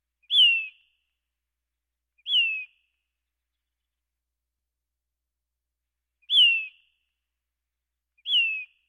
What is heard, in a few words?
Birds